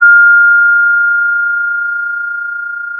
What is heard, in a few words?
pop, Sound of a school bell